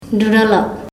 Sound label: laugh